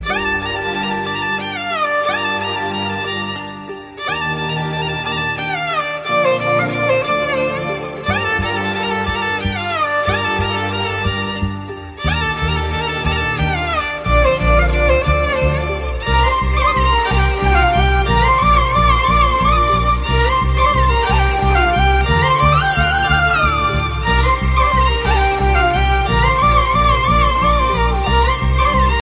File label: instrumental ringtone